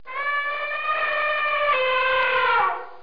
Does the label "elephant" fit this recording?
Yes